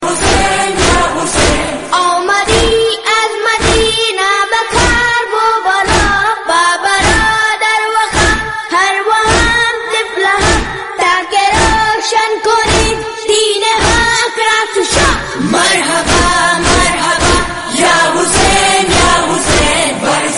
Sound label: noha tune ringtone